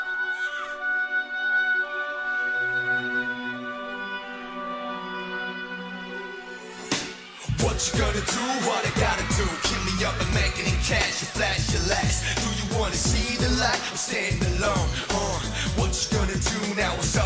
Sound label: Rap